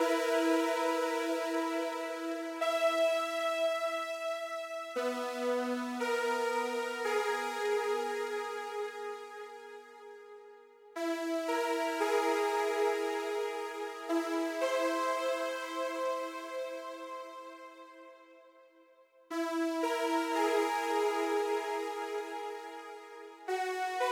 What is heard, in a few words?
Mix Stem Layer; quantum recharged gamerip 2023